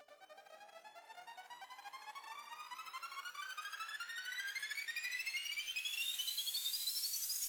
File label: Ultrabeat bip